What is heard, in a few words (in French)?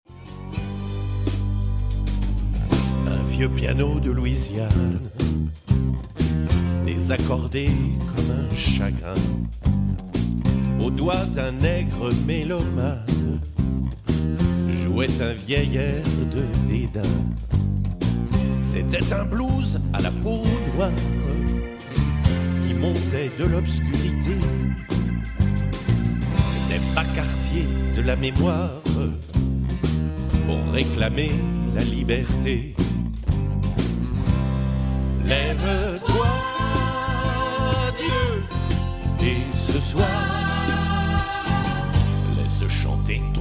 Vieux Piano